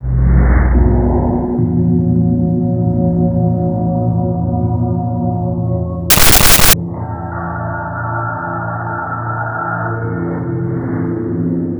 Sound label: SUB WAVE, Special FX Twisted; USB Soundscan vol.14; AKAI